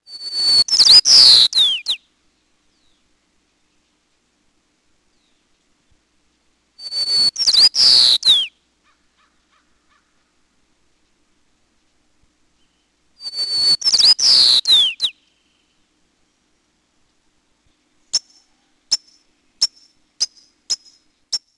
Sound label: white crowned sparrow